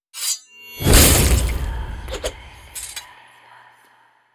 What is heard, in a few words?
damage taken; yami